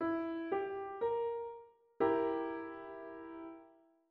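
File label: triad diminished E4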